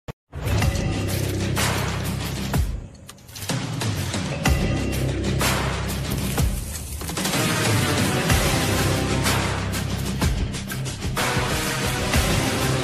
Bgm Ringtone, tamil ringtone; Maara

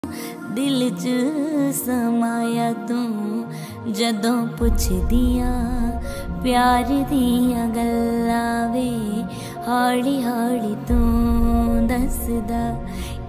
punjabi ringtone

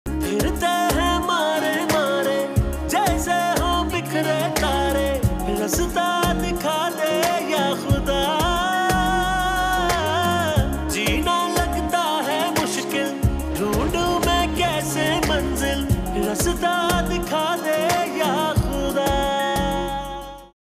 OST pakistani ringtone

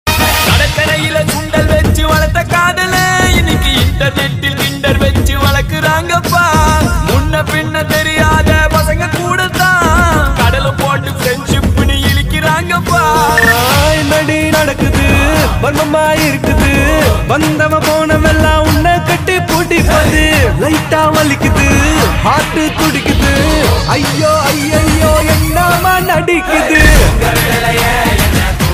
Mashup tamil ringtone

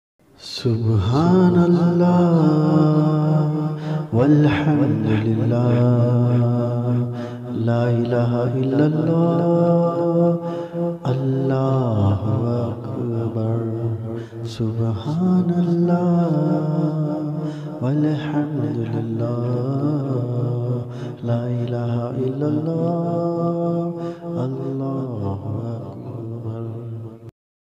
male version arabic ringtone

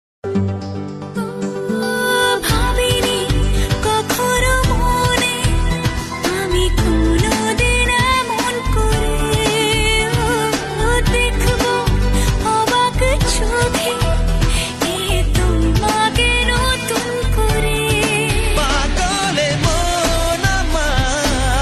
bengali ringtone

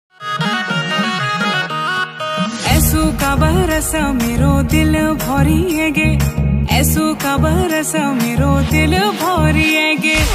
uttrakhandi ringtone

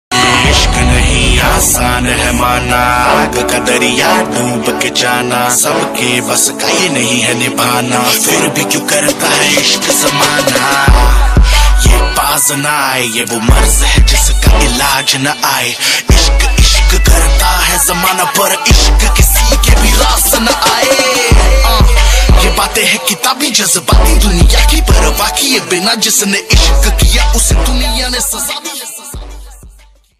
rap punjabi ringtone

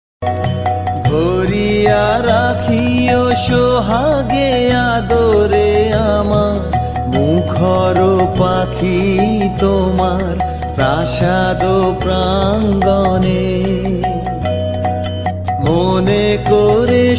Bengali Romantic ringtone

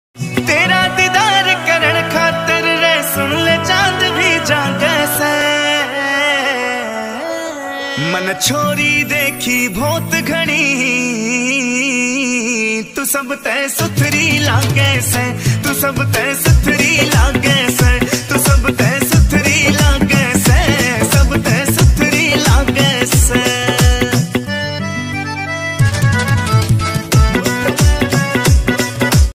haryanvi ringtone

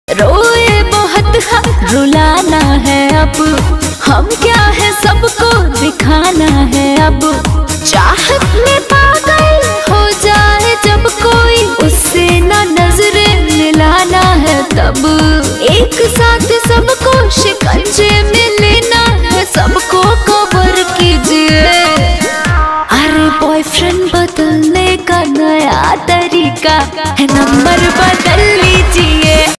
bhojpuri ringtone

